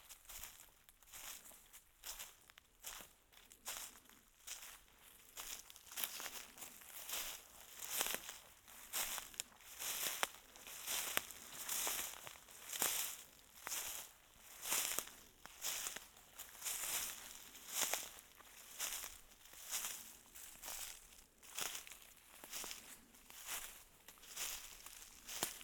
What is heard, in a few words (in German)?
Gehen Laub; Lexikon-Roman